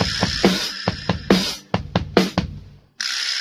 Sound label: stop for the rattle, drum loops, funky